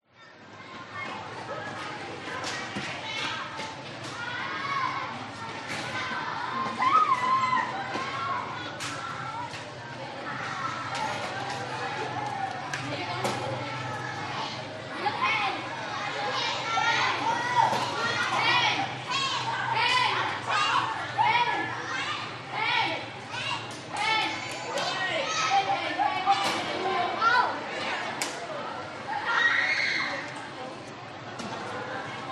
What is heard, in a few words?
public places school playground busy kids children walla vietnam